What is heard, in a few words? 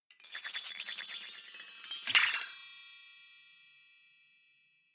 percussion